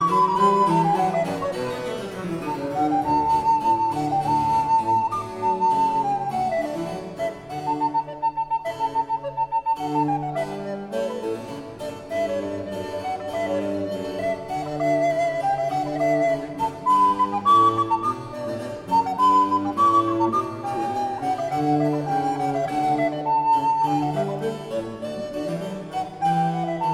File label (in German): sonatina c moll fuer altblockfloete und basso continuo vivace